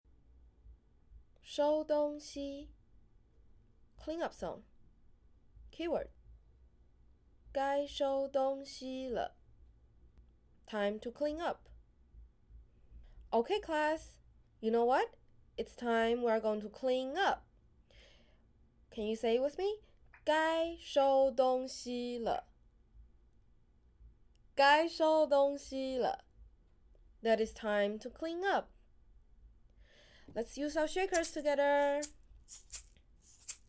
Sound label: Clean-up Song Mandarin; Rituals